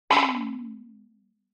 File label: Accent